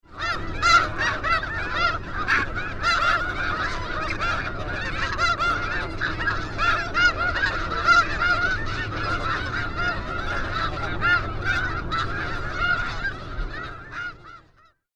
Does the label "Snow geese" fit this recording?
Yes